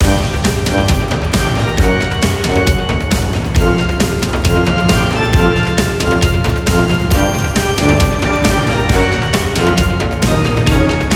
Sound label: The Clock Rock (Pipe Organ)